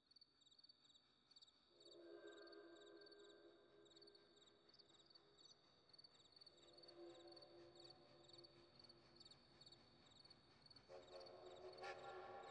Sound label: BINAURAL; CHIRICO